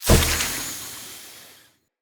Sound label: Root Cave Magic Spore Puff; Ambience; Props; Neathuns Root Cave